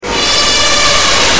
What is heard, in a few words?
meow; producthuntgame